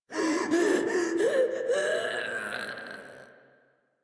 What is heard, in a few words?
Death; Warframe; Shik Tal; en